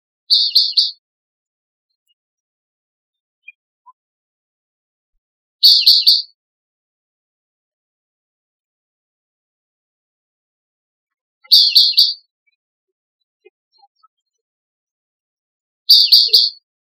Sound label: BEWR triplet calls; FAMILY TROGLODYTIDAE; A harsh vit, vit, vit (U); A sharp whit-whit or bsht (L)